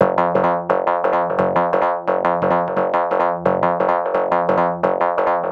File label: 174 Fm Acid Bass Loop